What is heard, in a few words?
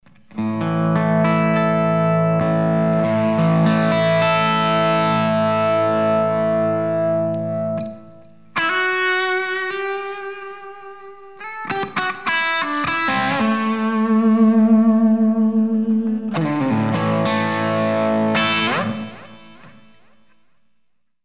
SD1 Half gain